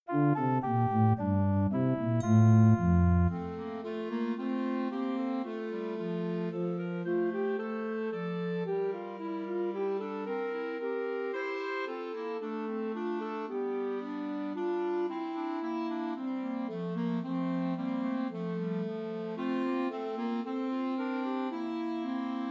Christmas Trios for Two Clarinets and Alto Saxophone; Away in a Manger